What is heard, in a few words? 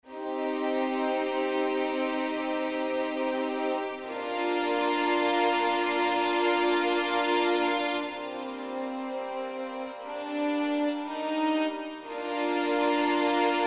presence conv